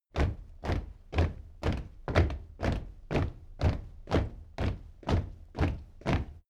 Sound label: step military march regular